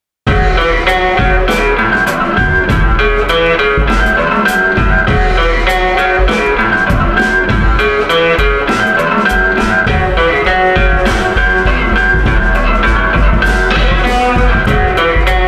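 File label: Slide Guitar Gritty with Drums 100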